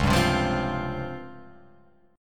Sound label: Db Major 7th Flat 5th Db down Guitar Open F; DbM7b5 strummed; JGuitar